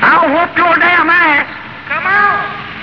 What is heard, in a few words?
whoop